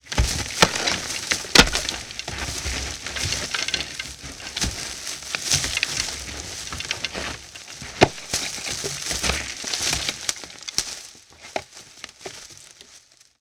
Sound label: Sweeping off twigs and leaves